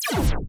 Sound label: discharge; magicalities